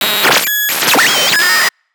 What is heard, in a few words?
Computer Device Power Up Activation Conformation Lo-Fi; Tech Future; Effectsworks